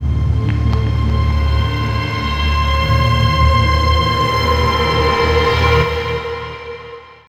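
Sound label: Rosencrantz C minor, Pads; Total Drum&Bass; 90 s Sample CDs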